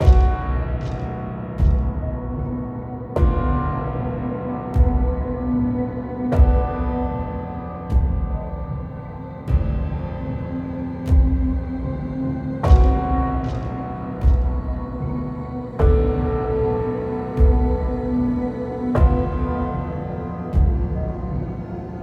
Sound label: Loop